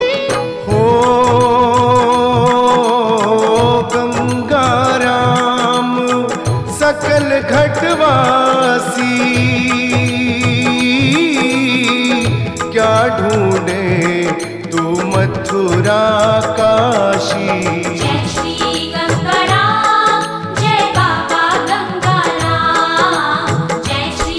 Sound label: Chopai ring tone